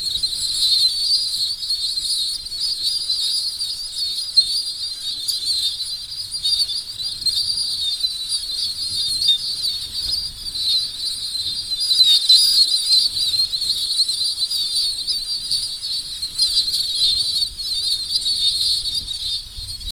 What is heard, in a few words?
snow storm and, arctic